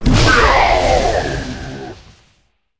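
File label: ghost death, monster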